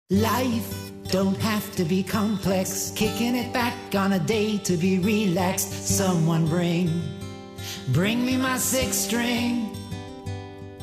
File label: six string